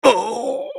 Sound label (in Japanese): ダウン; クラウドボネ